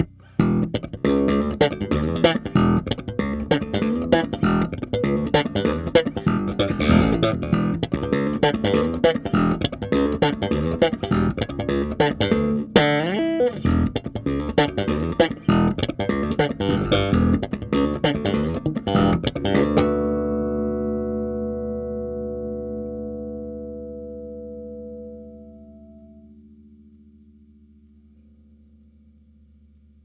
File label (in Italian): slappete